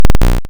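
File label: waveform FM square triangle envelope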